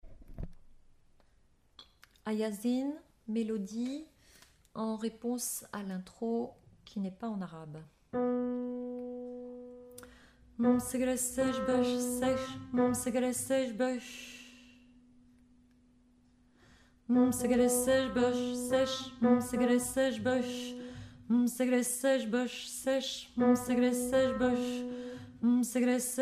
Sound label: Ah ya zayn Voix; chants